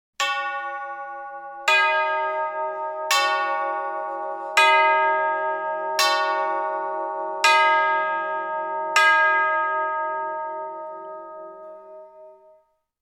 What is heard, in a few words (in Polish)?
dzwony rurowe